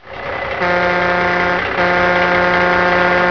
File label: dozer